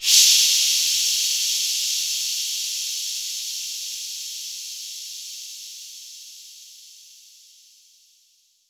Reverb Athmospheres, FX Vocals; Vengeance Samples

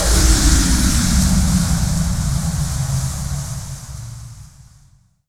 FX Boom

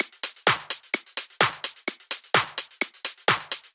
Drumloops 128BPM

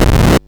Arcade Riffs

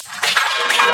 Minimal Fills; Minimal Loops

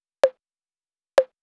808 Tom, 128 BPM; Vengeance Samples; VTDS2 Song Kits; Rap To The Top

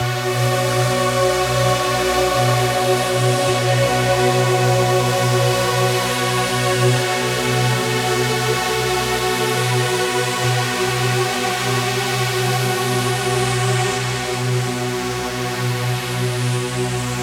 138 BPM Huge Pad Break, B minor; Melody & Synth

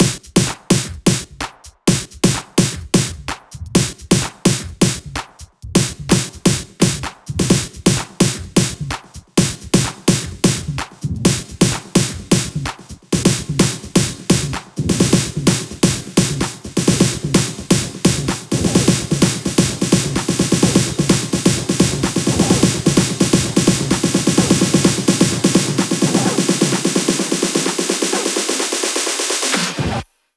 128BPM Fill; 16Bars; Lovers; Vengeance Samples; House Electro Fills

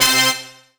Synths C; Vengeance Samples

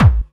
Bassdrums Hard Kicks; Vengeance Samples

